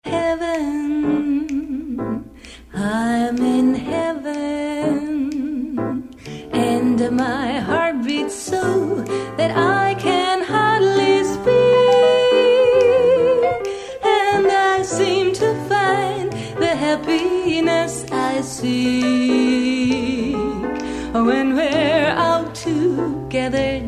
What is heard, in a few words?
mix piano